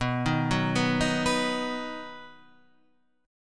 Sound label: D sharp Minor Sharp 5th B, Guitar